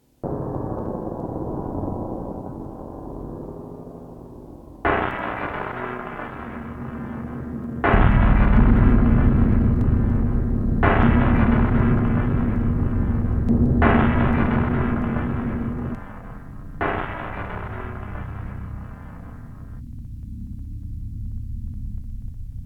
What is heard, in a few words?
Drone